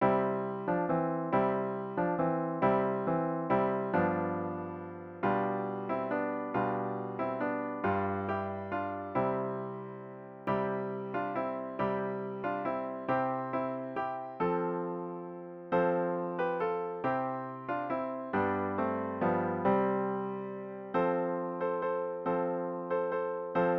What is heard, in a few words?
midi